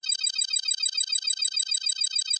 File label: science fiction computer processing loop